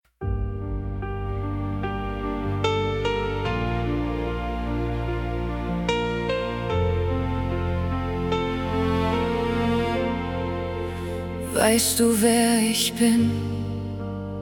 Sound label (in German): stimme im herzen